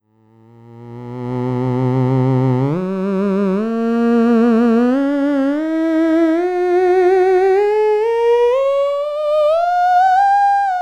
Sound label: note grab, theremin